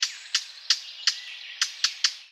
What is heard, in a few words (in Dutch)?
zwartkop roep alarm